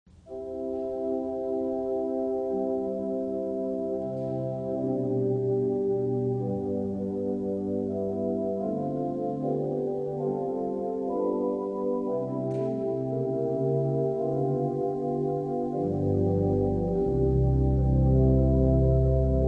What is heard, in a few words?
America The Beautiful (with chimes)